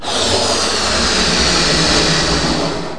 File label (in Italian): soffio; Interactive Media Design Review 1999